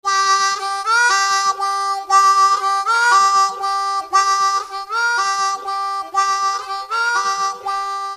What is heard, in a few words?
Effects, harmonica